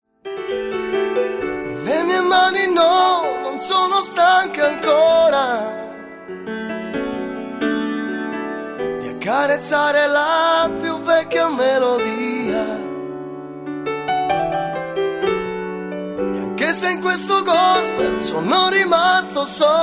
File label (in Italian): tempo di blues